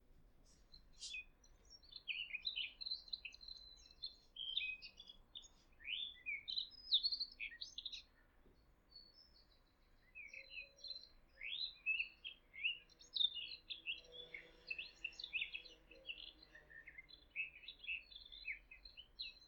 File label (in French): fauvette a tete noire